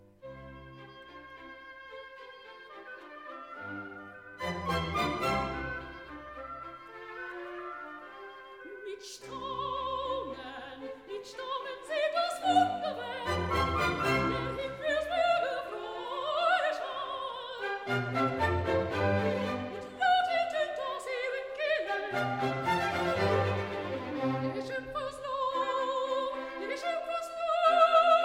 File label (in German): Chor mit Sopransolo; Haydn; Die Schöpfung; BRSO; Kubelik